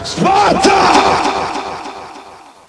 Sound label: port suck in, ambience; svencoop; cracklife